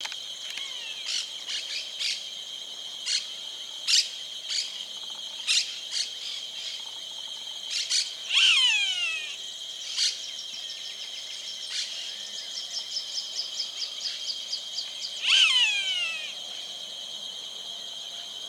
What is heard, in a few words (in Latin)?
Rupornis magnirostris, aves